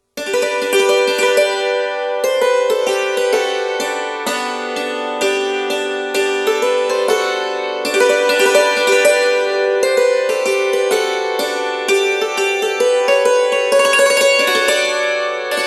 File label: trumpet tune